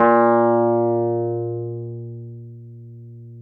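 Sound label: Wurlitzer electric piano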